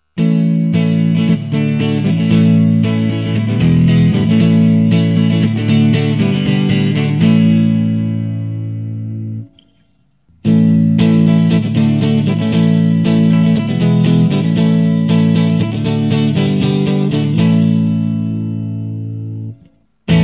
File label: Axe Strat